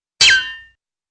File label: metallic doink with whistle